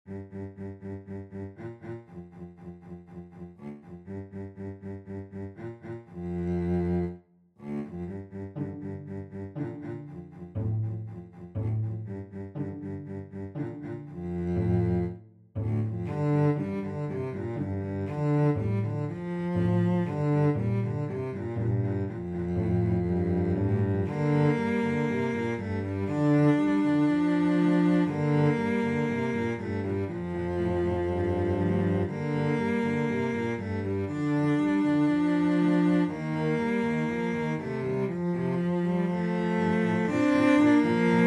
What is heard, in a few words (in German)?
Trioloncello; Besetzung: Cello-Trio; Schwierigkeitsgrad: 2 - Einfach; Tonart: g-Moll